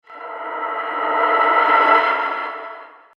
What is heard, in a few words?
Horror Tension And Release; Evil Sound Effects; Andrew Fleming